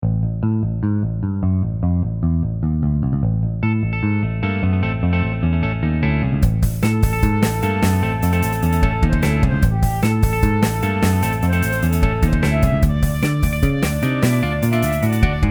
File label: MIDI blues; spellman studio